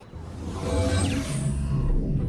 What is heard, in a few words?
teleport